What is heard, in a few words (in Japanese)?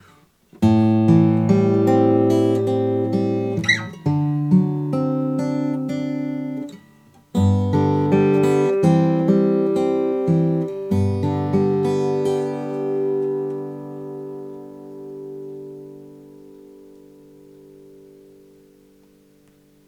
arupegi; Sony